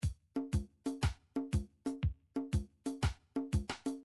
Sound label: reggae